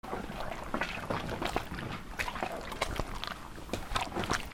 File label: HOUSE